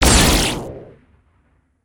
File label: Energy Ball Impact; weapons; aronwk collection; ndaudio